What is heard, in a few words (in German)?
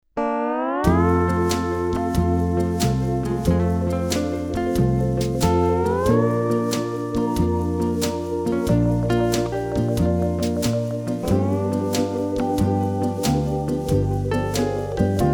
Jingle; Therme Geinberg